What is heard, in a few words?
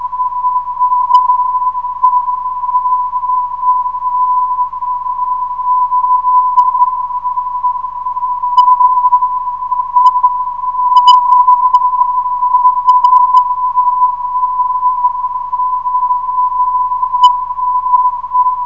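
VU 337.120kHz, non-directional beacon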